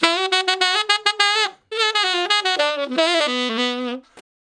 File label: Ten Sax Straight (B), R&B; Solos